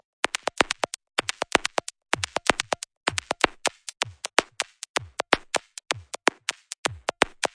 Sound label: microscopic analysis, drum loops and breaks